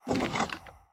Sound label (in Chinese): 凋灵骷髅待机声